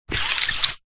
office plastic document folder wallet push slide wood desk